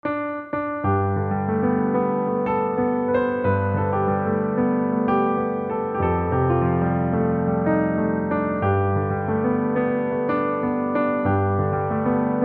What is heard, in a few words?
Piano Ringtone